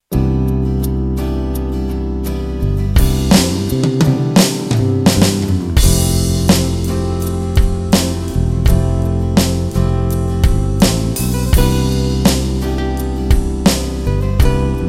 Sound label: Autumn Ballad